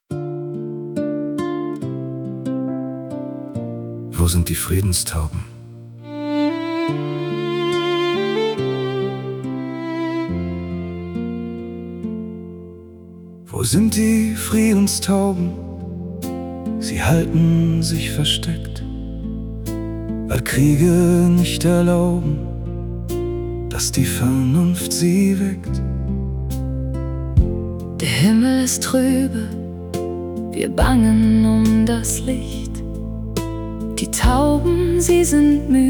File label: male female